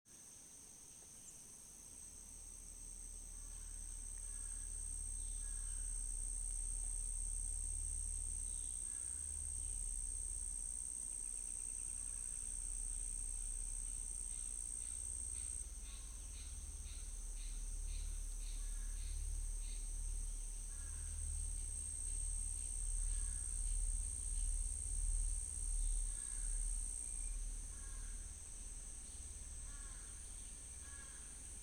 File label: Crickets Evolution Soft